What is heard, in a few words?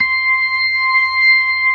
C6, B3